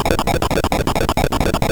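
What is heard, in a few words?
Mountain King, MOS 6581 SID chip music, games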